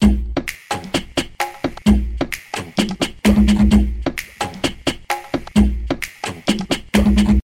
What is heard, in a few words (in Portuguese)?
funk